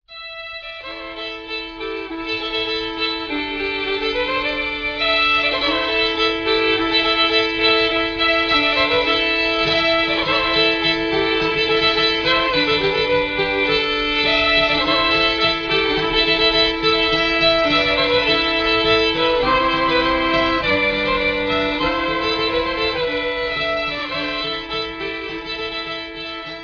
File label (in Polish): polka bretonska